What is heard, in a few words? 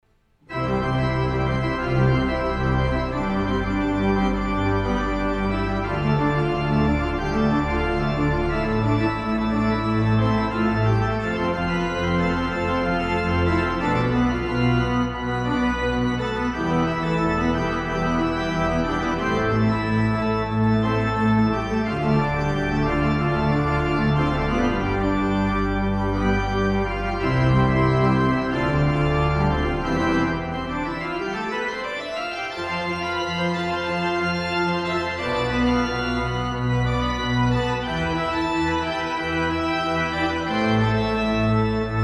Pop Prelude